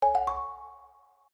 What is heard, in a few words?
Orchestral Slide Open